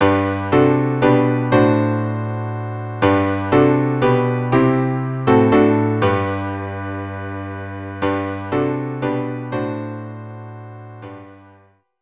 Finlandia, hymn string quartet

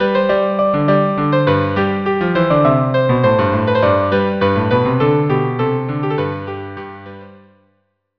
bouree violin cello duo

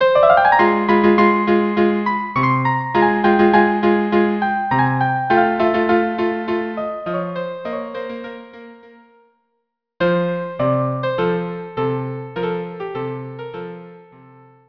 violin viola; Toreador song

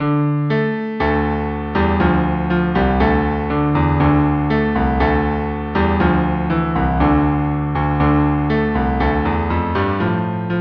cello duo

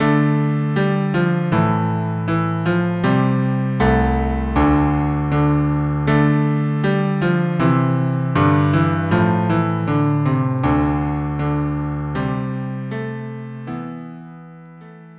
cello duo

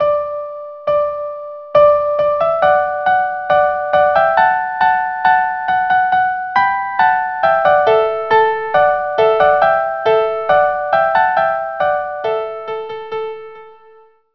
March and Fanfare; Corette

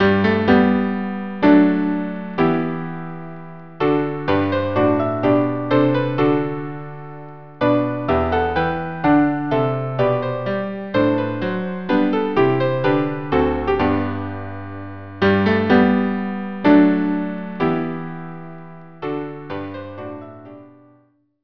quartet; scipio

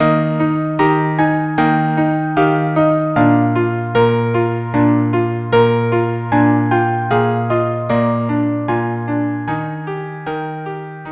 trio; Winter 2nd mvt

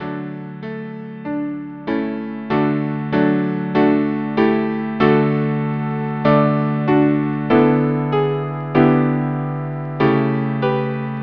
quartet; ave verum corpus

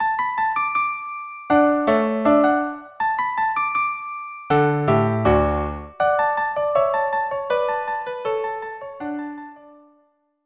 Gavotte; Princess of Navarre

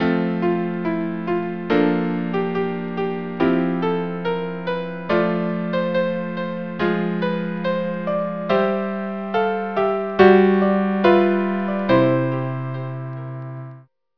quartet

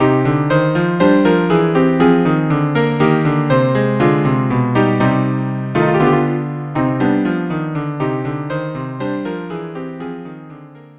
rondeaux trio; rameau

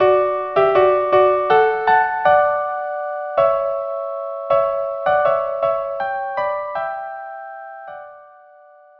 violin duo

